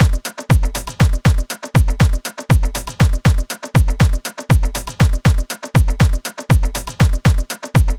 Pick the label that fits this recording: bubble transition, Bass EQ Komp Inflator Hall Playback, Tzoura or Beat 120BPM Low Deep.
Beat 120BPM Low Deep